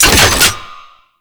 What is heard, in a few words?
Front Line Ammo Pickup; Tau; Twelve Minute War